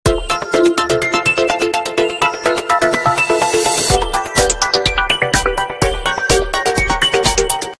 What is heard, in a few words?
Lounge Bell, Simple Tone; Samsung SCH-W770; ringtones